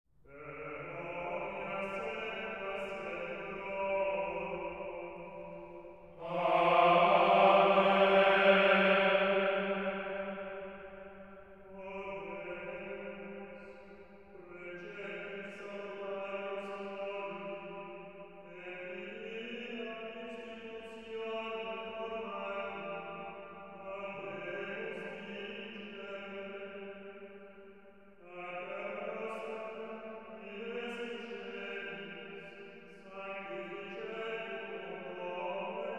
Pater Noster (chant) Per omnia saecula saeculorum... Oremus Praeceptis salutaribus moniti